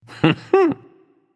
heh heh; smite; loki

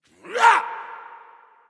grunt; Smite; bacchus

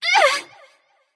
grunt hit light; smite; voicepack; hel

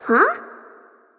nv confused; cupid

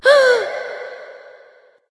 breath light; smite; voicepack; hel

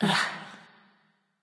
ugh; smite

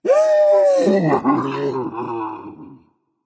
boohoo berserker; cuchulainn

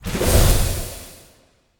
spin; smite; god serqet

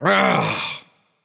Ugh; smite; achilles

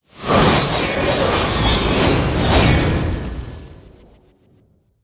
combo; smite; god arthur

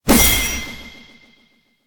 slash; smite; daji

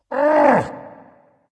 grunt lift dragon; fafnir

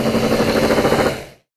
buildup; smite; scylla

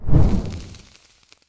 victory lyre ignite; smite; Apollo

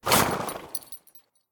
deactivate; smite; lancelot

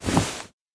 footstep cape; smite; Apollo

